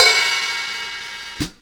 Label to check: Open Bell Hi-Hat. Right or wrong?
Right